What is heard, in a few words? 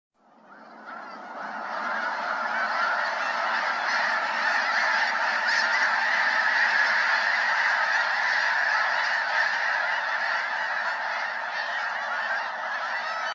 Snow Geese Flock